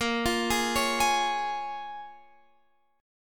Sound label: A sharp Minor 7th, Guitar, Drop D